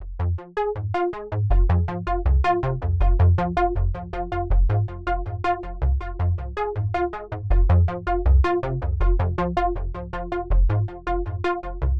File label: little 80bpm blend beats; looperman